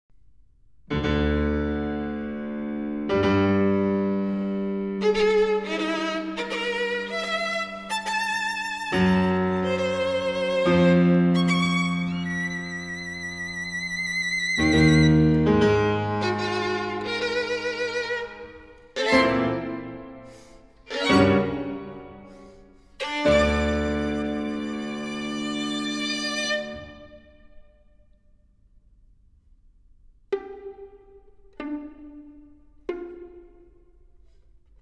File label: Sonata For Violin and Piano Largo; Op. 134